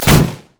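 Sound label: minefield explosion; LoL; ziggs madscientist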